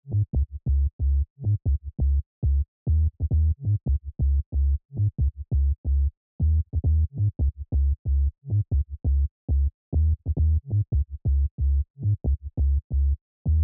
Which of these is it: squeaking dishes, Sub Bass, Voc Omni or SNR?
Sub Bass